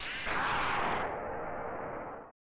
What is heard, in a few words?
Logo Roar; Joe Mac 2 Lost in the Tropics; snes